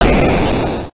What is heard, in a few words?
explosion; The Aero Guy